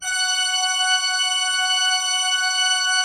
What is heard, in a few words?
F5, cathedral organ; Propeller Island